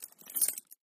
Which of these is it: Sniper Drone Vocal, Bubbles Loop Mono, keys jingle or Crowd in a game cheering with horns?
keys jingle